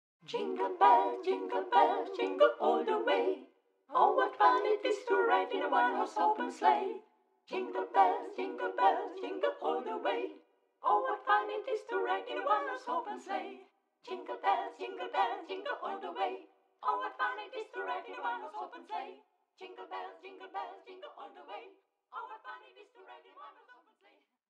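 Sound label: Jingle bells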